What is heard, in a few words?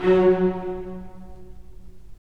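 STR, strings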